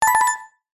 cartoon musical riff cheeky electric piano blink flutter